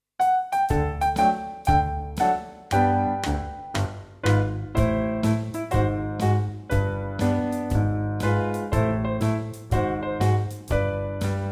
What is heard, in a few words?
Dance Steps, class tap